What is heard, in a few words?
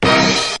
Stabs